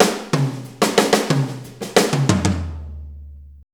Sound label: 90 BREAK, DRUMS